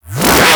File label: Charge Gun Shot Retract A; Crp Charge Gun